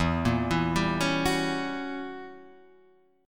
Gb 7th Flat 9th E, guitar

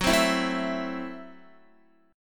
F sharp Minor 7th Flat 5th F sharp Guitar; strummed

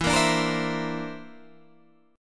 D 7th Suspended 2nd Sharp 5th E

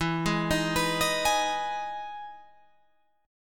E Major 7th E down Guitar Open F; EM7 arpeggiated

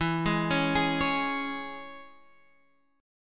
E 6th, down, Guitar, Half Step Down

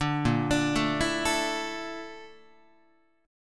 Bb Major 7th Flat 5th Bb Guitar; BbM7b5 arpeggiated; JGuitar

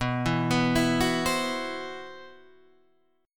C Major 7th Flat 5th B

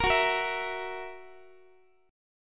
Gb 7th Gb down Guitar, chord; x,x,13,16,14,15; Listen to Gb7 strummed

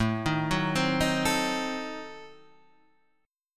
A Diminished 7th A, Guitar Standard; Adim7 arpeggiated; JGuitar

